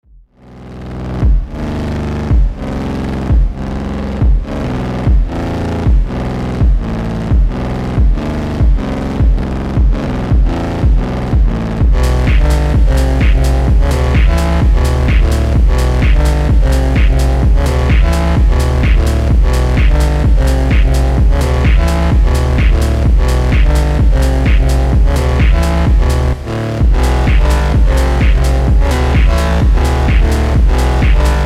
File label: FONK, Slowed + Reverb; FONK LETA